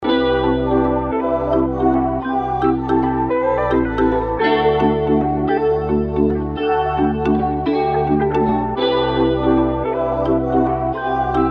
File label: rnb